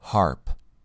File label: harp